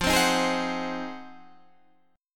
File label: F Minor 7th Flat 9th